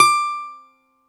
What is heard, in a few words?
Balalaika, Prima Balalaika, Plucked Strings